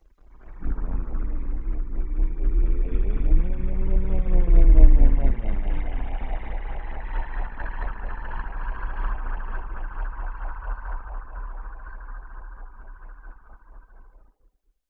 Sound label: weird slow